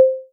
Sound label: beep